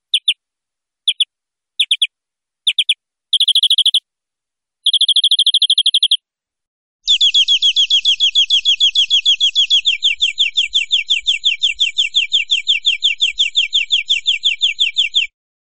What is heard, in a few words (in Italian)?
Verdone, canto